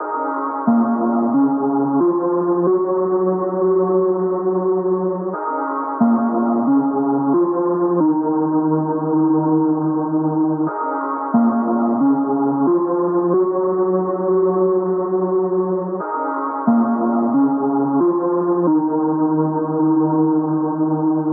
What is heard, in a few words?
vocal chop, 90, Ebm; Emotions; Slate Digital Omnivox Vocal Sample Pack; Chops